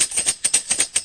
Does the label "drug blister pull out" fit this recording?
No